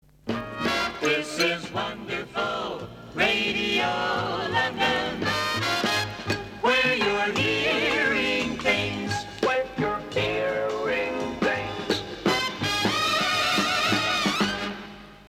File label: jingle; R London